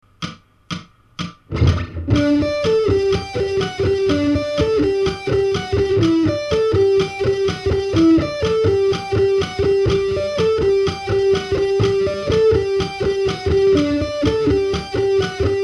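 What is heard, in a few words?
string skipping fast, guitar; string skipping ex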